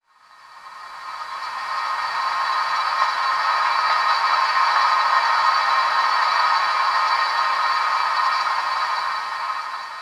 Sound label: Glassbreak Rain, Chalice Well